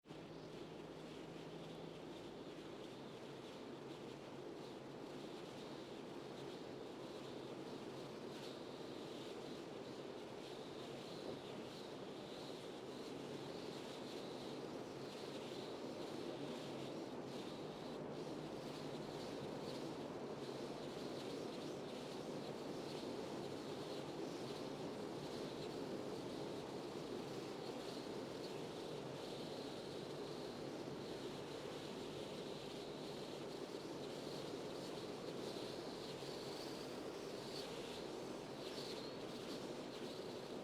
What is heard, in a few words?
windy weather driving